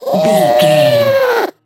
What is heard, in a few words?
goblin fairy growl